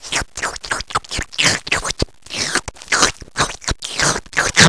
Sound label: wolf lick